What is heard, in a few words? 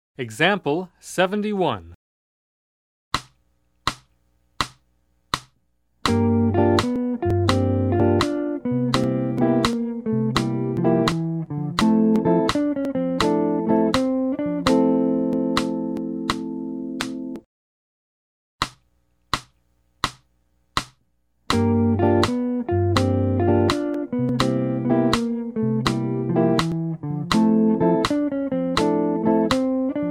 Jazz; jue shi ji ta